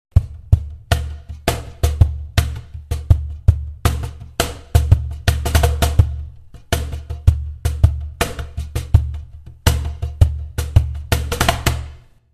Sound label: cajon